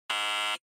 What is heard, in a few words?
Electromagnetic Buzzer, Dry